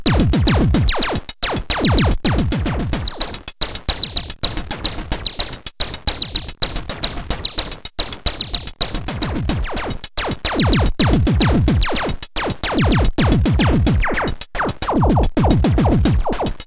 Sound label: Loops And Drones